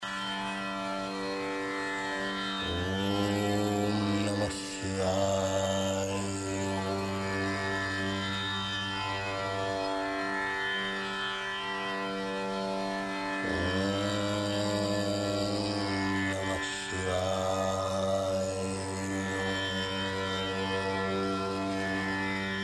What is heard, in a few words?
om namah shivaya; yoga; mantry